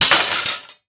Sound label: Death Underlay; Black Queen